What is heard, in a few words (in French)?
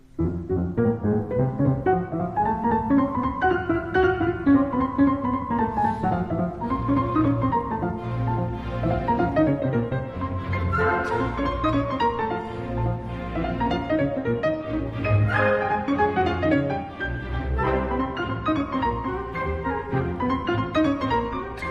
concerto pour piano, allegro; TCHAIKOVSKI